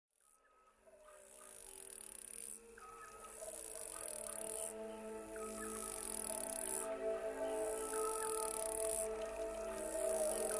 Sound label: forest walk